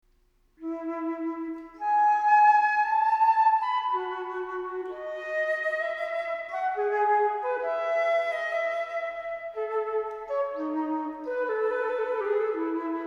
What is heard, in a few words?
Crystal Flute; Ringtone